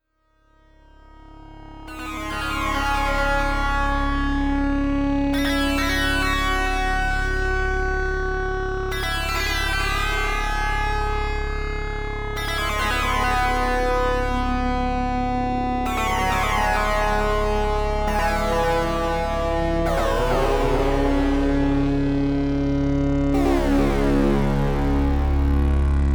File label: Eurorack Sync